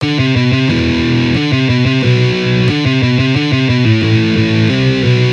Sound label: Easy Guitar Riffs